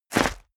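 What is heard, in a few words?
step dress boots concrete grit jump, footsteps; schritte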